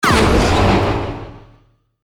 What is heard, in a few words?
missile launch